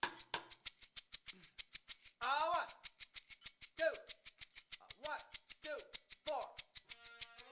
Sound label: Shaker First Loop